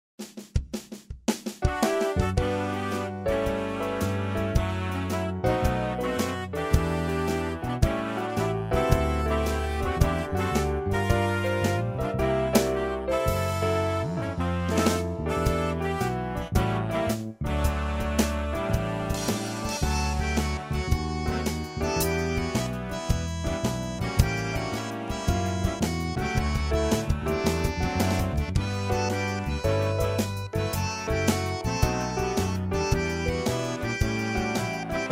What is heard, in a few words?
Instrumental